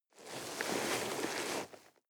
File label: nylon leather soft